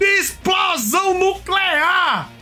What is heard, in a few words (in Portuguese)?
explosão nuclear